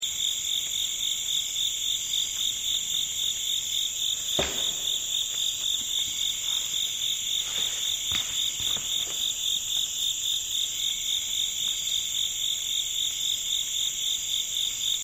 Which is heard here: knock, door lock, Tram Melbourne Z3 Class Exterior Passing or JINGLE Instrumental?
knock